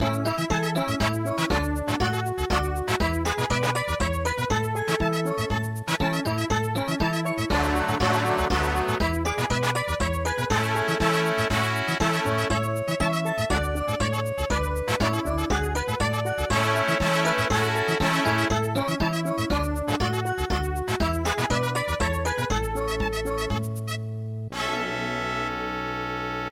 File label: mambo